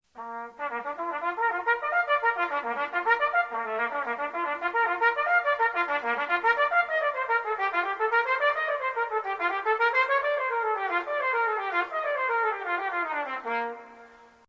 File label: trumpet; Arban